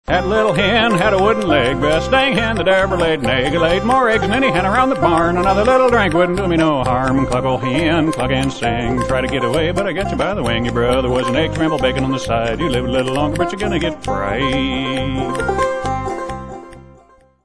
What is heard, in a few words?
cluck